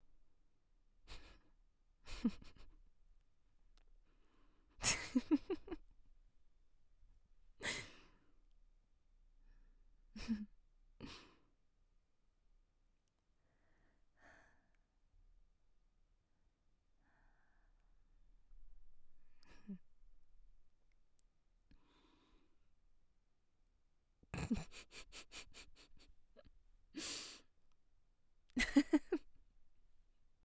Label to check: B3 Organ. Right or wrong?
Wrong